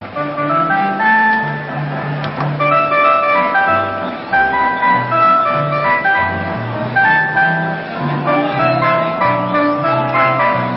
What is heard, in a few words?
music bed; sounder